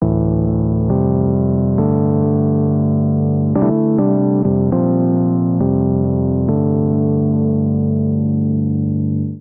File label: Dark Chord Piano A Minor 102 bpm; MIDI Clips; Tonal; Accompaniment; Drive and Glow; Ableton; Factory Packs